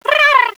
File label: seagull